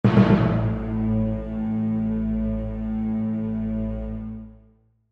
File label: Hit Orchestral, Hit Orchestral Low Dark; Musical; Blastwave